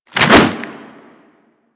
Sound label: Swing C; Warframe; Infested; Puss Ancient; Attacks